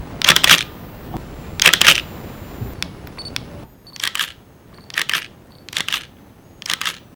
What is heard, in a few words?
Just Nikon D80 Sounds